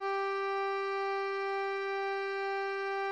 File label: instrument note